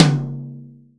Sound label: high floor tom drum sound c sharp key; Duration 0:01; Mono